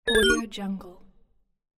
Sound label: failed